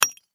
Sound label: Impact Brick Hit With Hammer; Hammers (Sound Effects:Tools); Blastwave